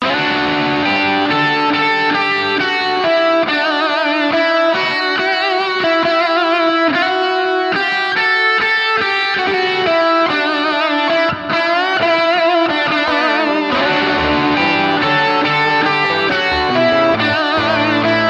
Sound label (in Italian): chitarra suoneria; Rocco Schiavone